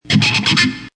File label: Dist Gui Scrtchs, Distorted electric guitar scratches.; Musical